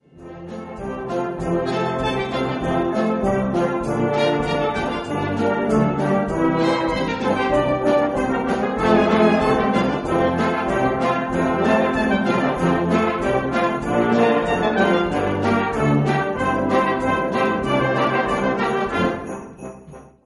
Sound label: Jingle Bells, band; christmas crackers